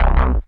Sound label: BLURP LOW, Hard & Loud Techno